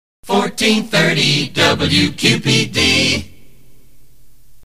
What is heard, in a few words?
jingle accapella; WQPD; 1430 WQPD